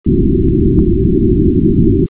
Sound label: 400Hz lowpass noise